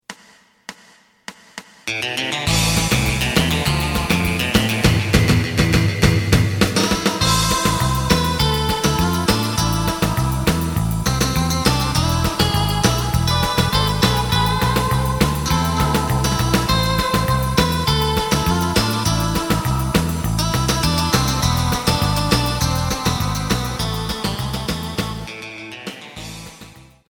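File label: side guitar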